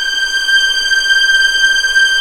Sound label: G6 EXP MF, VIOLINS; East West; The Ultimate String Collection